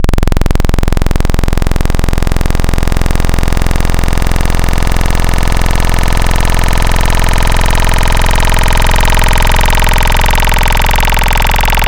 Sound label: Saw Growl, Wavetables; Cymatics; Nightmares Vol 2 Wavetables; Serum